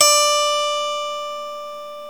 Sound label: SWEDISH; World Combo